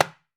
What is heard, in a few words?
ROSEWOOD, African Drums; E-MU Formula 4000 Series Vol. 4; Earth Tones